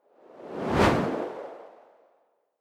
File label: whoosh simple hard high